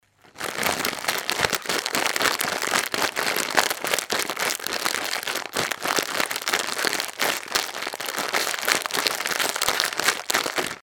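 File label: sound of rustling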